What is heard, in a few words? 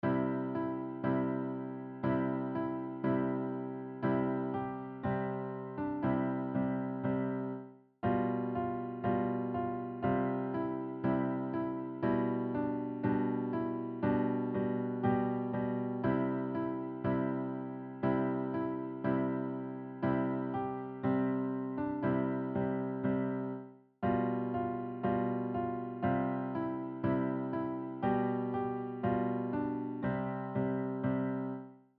Jingle Bells; やさしく弾ける名曲集：ピアノ&クロマトーン